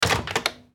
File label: Microwave